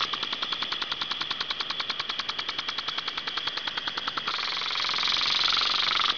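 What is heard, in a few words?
sprinkler; Image Bonanza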